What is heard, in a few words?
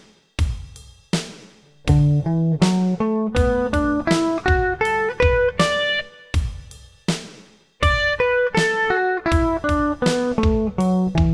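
D major pentatonic; scales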